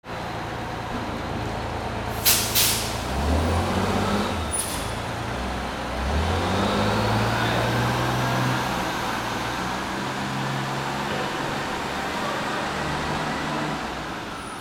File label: VEHICLES